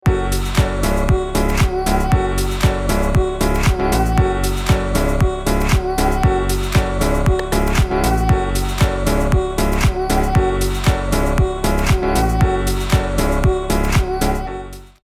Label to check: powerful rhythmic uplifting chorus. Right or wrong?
Right